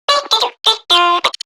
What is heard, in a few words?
Garden Drone Vocal